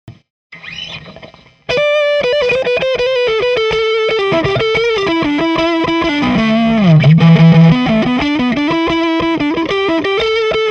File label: tele neck distortion